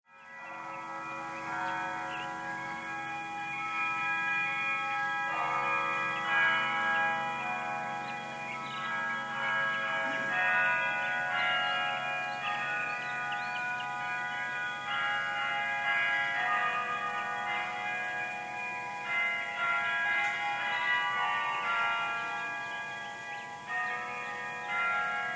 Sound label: Carillon